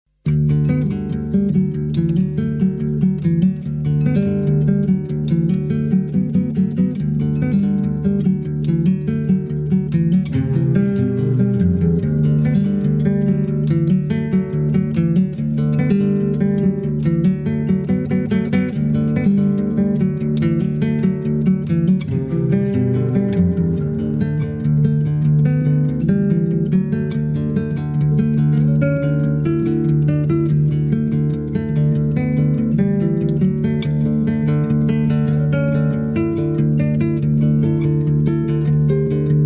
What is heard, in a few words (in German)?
kleine gitarre